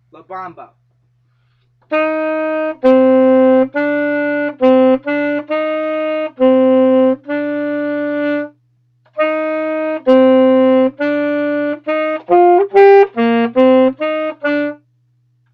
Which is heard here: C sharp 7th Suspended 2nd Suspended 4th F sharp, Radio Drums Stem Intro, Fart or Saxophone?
Saxophone